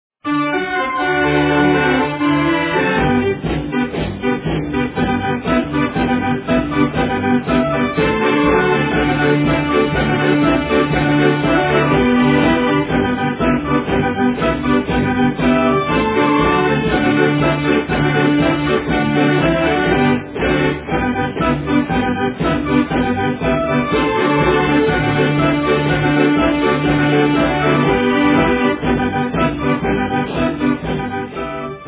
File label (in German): trompetenecho